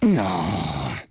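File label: male angry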